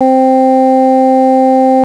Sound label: Oct Plus 5